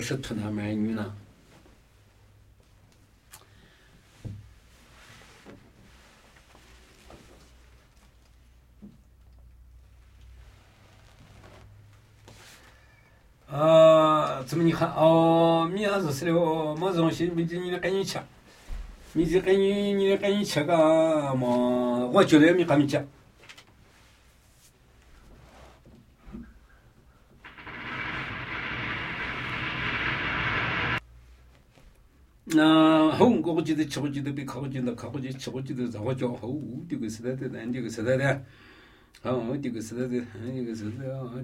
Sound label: Namuyi Tibetan Ritual Chanting; Phi tca pi su